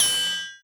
synthesizer metal no reverb

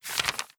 page turn

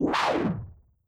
beast roar more savage

